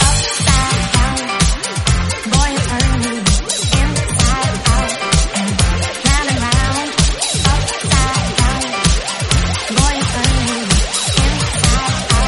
129bpm Gm; Club Mix

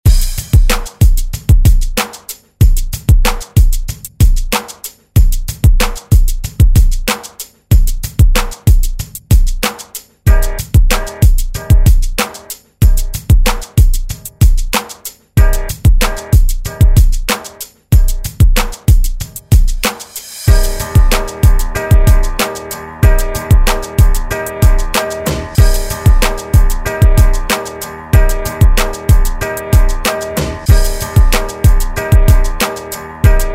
70s Ext Redrum

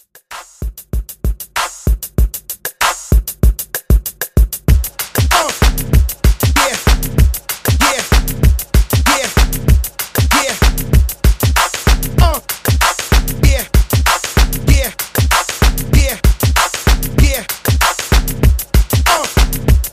Pop Old School Re Drum 96 BPM

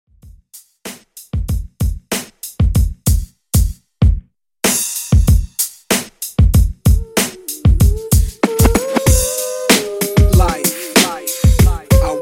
Old School 95 Bpm; Redrum

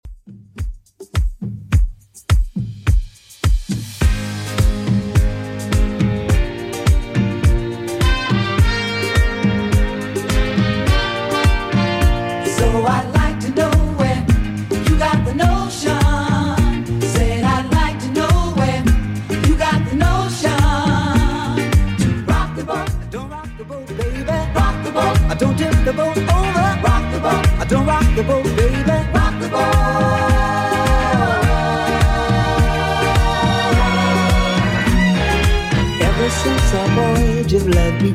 70s 105bpm; Redrum

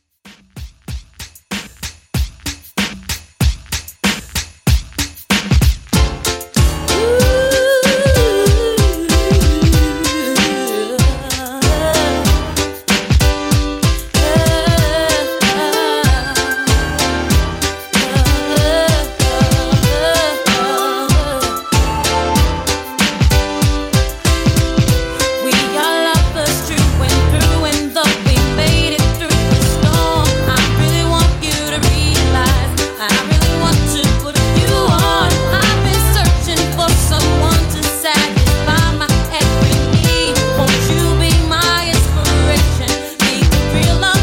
95Bpm

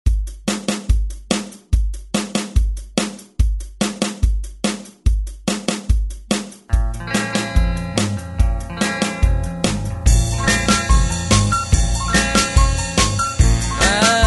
60s ReDrum; DJ Mhark

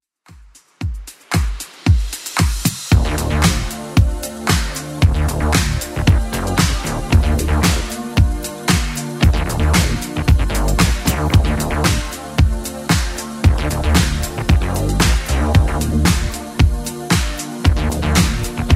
80s Club Edit 114bpm

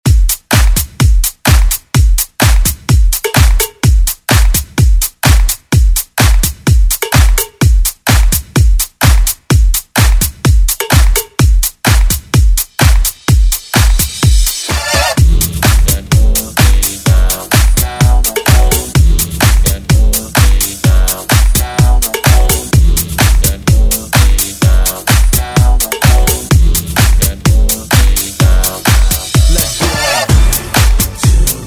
90s Ext Redrum

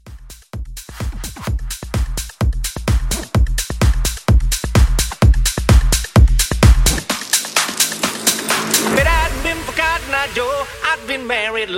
Jump House Edit 128

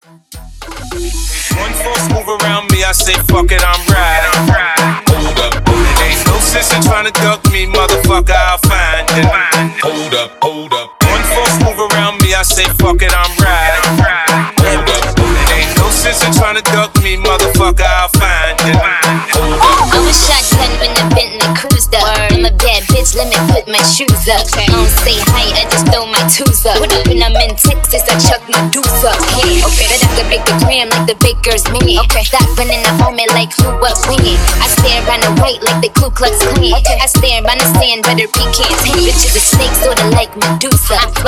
Club Moombah Break Beat 101 BPM

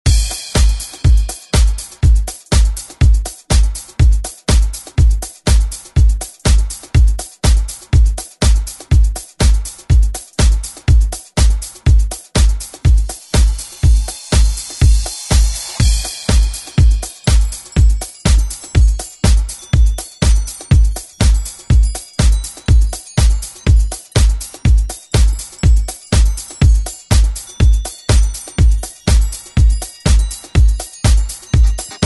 70s Instrumental ReDrum; DJ Mhark

